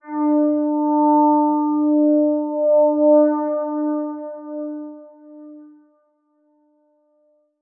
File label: Transition Musical Tone Warble; Production Element